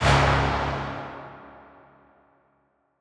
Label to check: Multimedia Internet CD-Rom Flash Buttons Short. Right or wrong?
Wrong